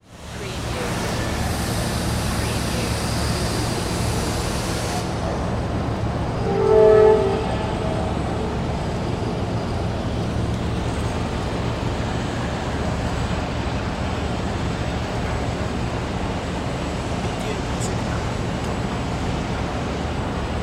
Harbor Ambience, Marina, Boat, Horns, Urban

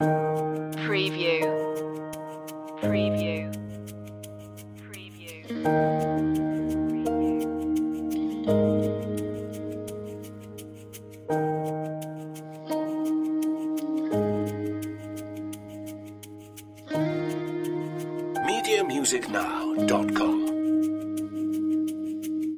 lovely landscapes loop

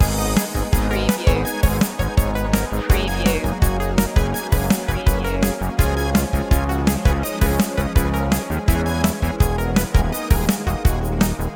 antiquated loop

